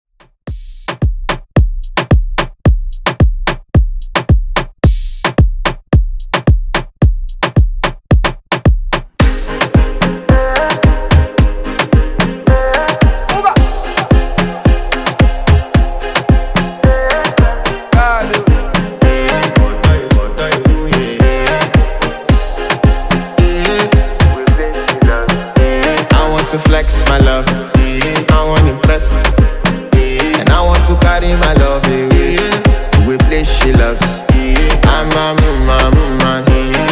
afro Redrum 110Bpm

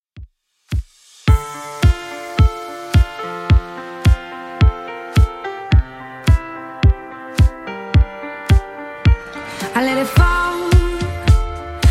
2ks Redrum 108bpm

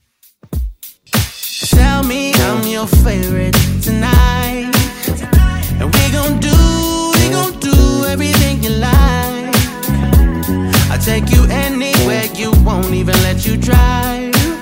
Funk Intro Outro Clean 100Bpm

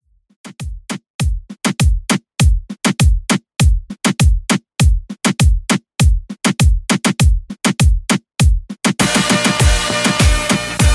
Reggaeton 100 Bpm

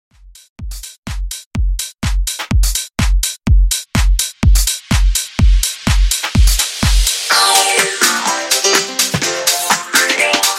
Dance House 125 Bpm

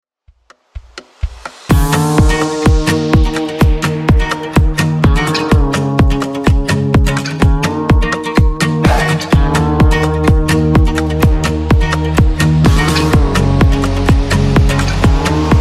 Top40 Simple Redrum 128bpm

